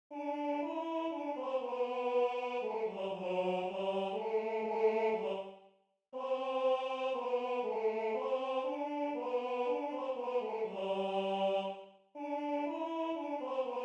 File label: Tenor II; Bauernmesse; 5. Sanktus